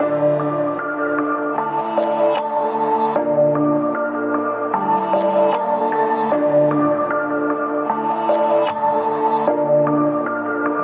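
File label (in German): snare vorher; EQ Tipps für Beats; 5 EQ Tipps für Beats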